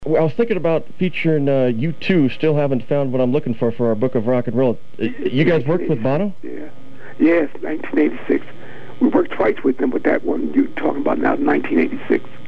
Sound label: gospel choir; harlem; rock and roll interviews